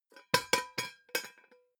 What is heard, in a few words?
pot lid drop close; objekte; topf